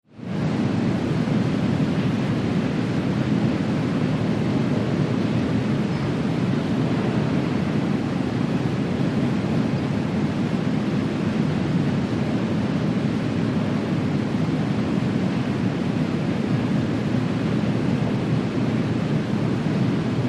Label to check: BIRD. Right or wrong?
Wrong